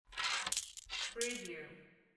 counting coins

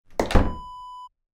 sauna door close

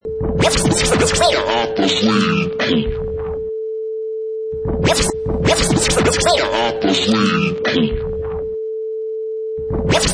Vinyl Crazy Scratch 105 bpm

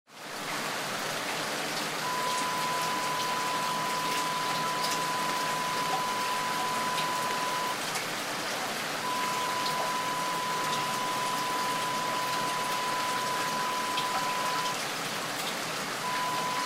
rain downspouts drain

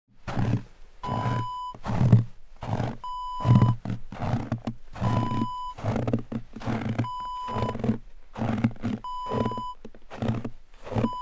footsteps icy stairs

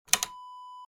button switch

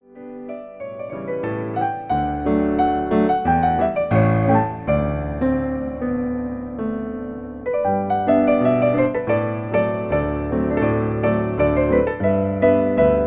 piccolo

